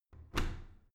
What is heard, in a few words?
washing machine modern close soft